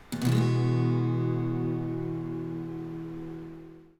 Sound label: Eadd9, guitar